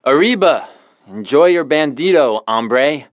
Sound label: band